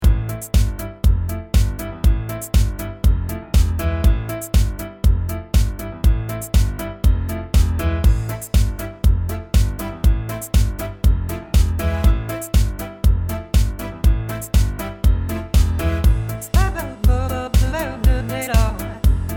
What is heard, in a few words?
Smooth Nu Jazz